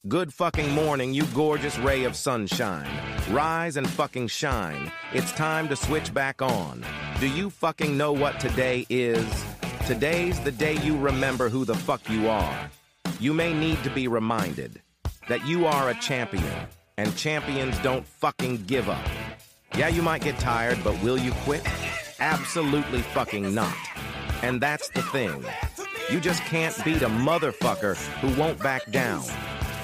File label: inspirational